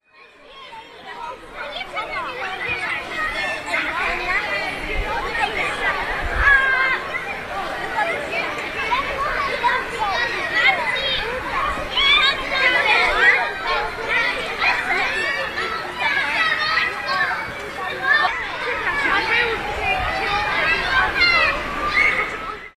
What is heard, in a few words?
Children